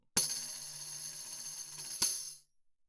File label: tambourine shake roll ff; Percussion